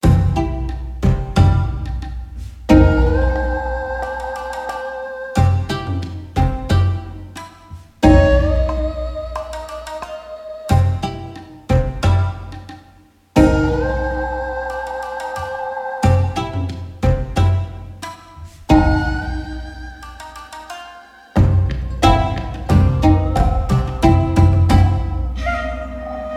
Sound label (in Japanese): 朗らか日和